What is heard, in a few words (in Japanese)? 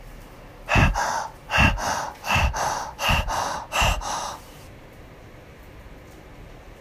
声帯の外転